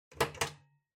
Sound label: Ceiling Light Pull